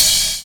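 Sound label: NICE SNARE; Univers Sons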